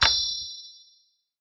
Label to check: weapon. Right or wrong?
Right